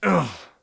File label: pain; police